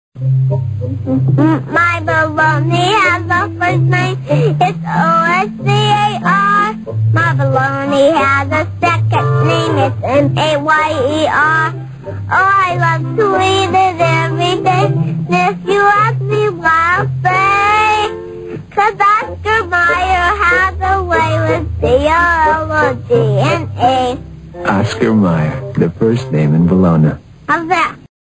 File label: TV Commercials; Oscar Mayer